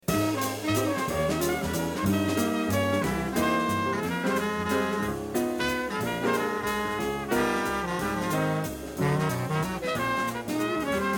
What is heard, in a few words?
waltz